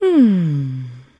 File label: trader sigh; 7D2D; 商人杰恩; 七日杀